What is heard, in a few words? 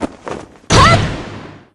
heavy slash